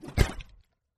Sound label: axe impact